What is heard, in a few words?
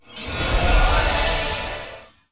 random Symbol Transform; fairyhansel